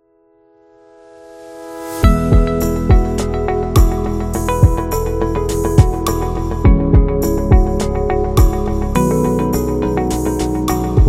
Quiet Background

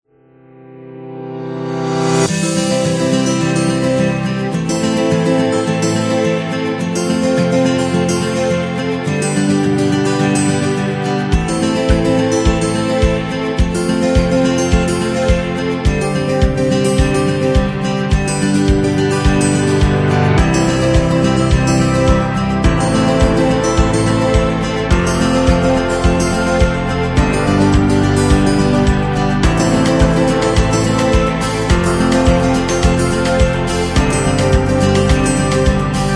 Love Acoustic; happy music vol 9

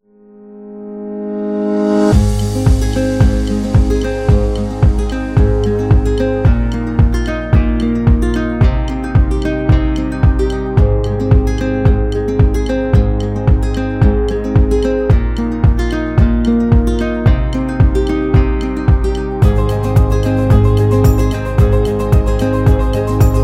Elegance

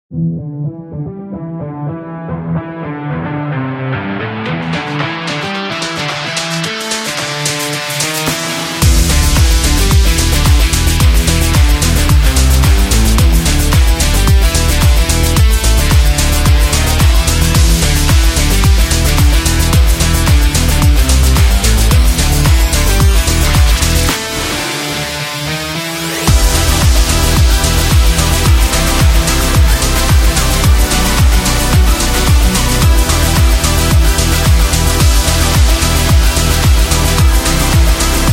Pop Rock

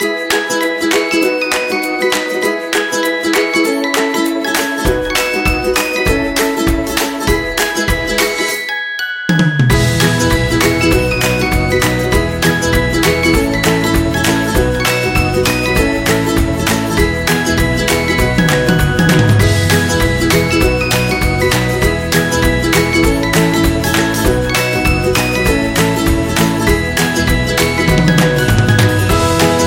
Animal Dance; birthday music

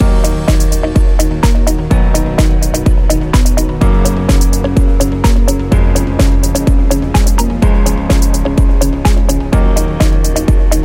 Promo Loop Corporate

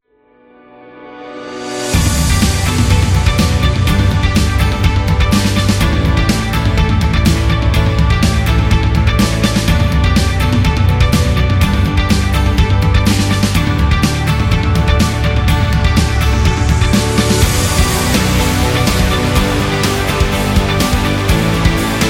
Energetic Corporate Rock

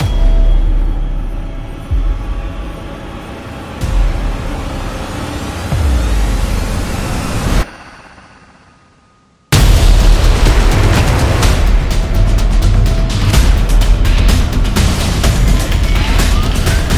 The Horror Trailer, fear

